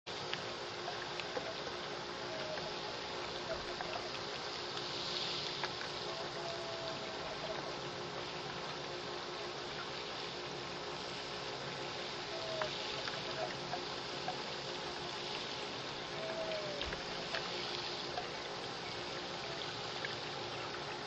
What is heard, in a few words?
mournful squeak